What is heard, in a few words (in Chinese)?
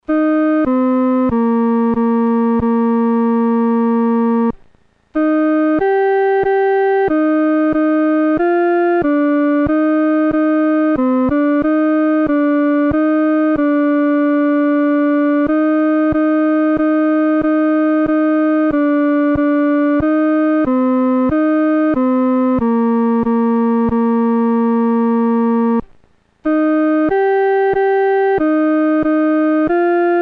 独奏 第二声